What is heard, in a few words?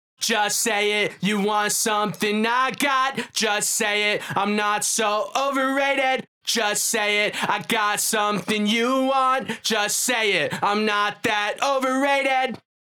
VOX BRITE TITE